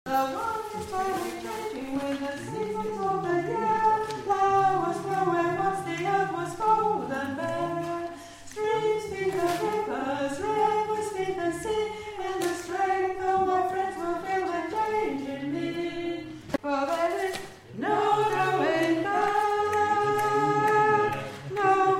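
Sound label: Soprano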